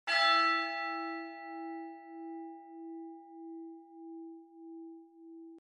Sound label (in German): Glocke; Instrumentenkunde